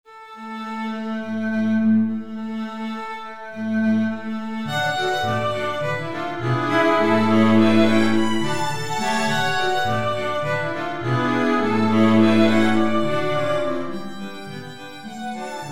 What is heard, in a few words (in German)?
streichquintett